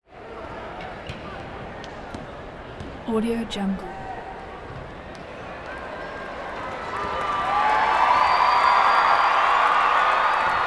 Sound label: stadium crowd; prosoundfx